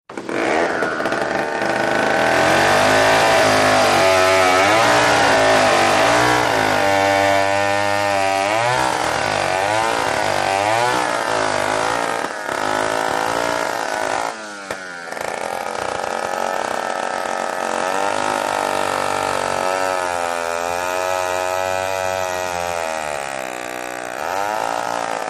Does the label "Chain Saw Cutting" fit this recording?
Yes